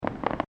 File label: Fireworks Multiple Crackles; Fireworks (Sound Effects:Backgrounds)